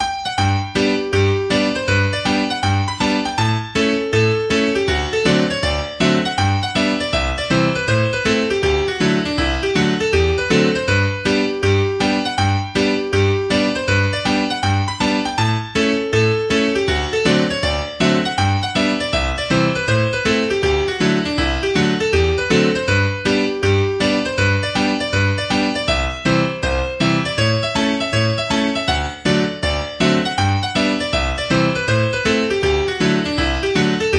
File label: Hornpipe; College Hornpipe